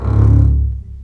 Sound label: String Collection, MARCATO; C#1; East West - The Ultimate String Collection